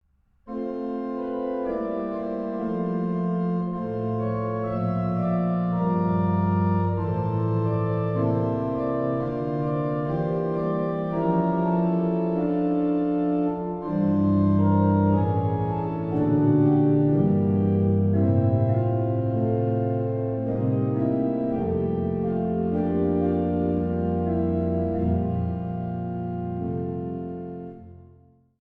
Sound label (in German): choralvorspiel es ist ein ros entsprungen